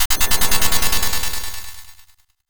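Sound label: synth, Production Effects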